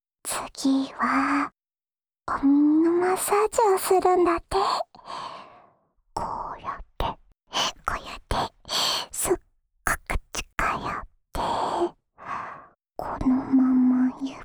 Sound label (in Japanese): お耳マッサージ（右耳）, ASMR